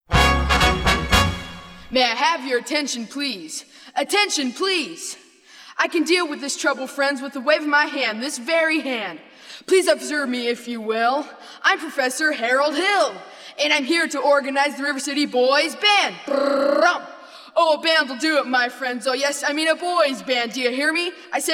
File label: minus vocal